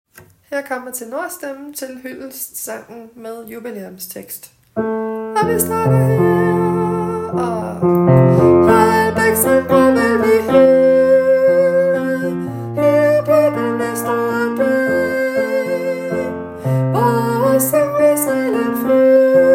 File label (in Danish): tenor